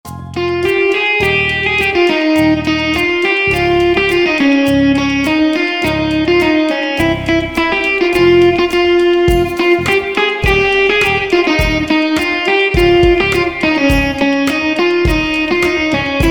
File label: ringtone